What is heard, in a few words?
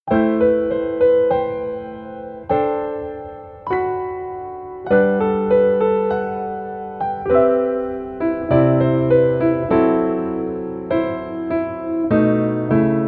Piano ver